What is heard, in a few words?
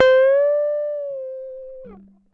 GTR TRIK, Jazzistic; Univers Sons